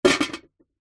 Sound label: household medium sized metal stockpot cooking pot empty set down on hard surface; zapsplat